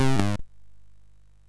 Tilt, MOS6581R3

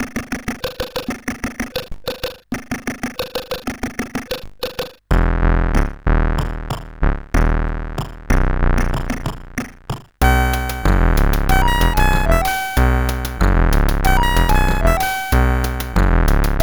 sid, 8580; CSG8580R5

